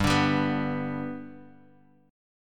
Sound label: G Major down; G strummed; JGuitar